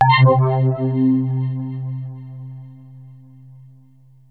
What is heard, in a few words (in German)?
Wavegenerator Beispiel Long Path